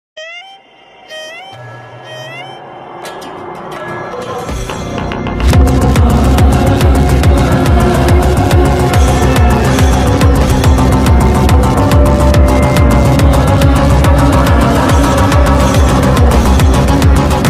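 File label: remix background music ringtone; bramayugam movie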